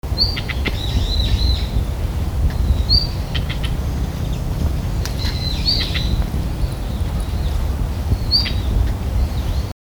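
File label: ruribitaki, red-flanked bluetail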